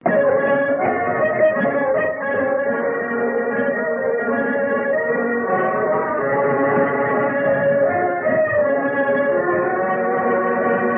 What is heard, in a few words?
Waltz